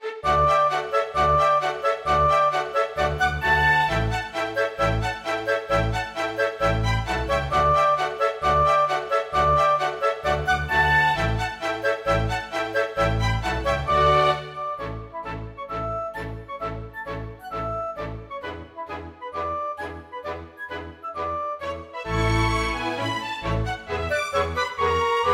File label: Gallopade; Ursin; storgårdsmusikk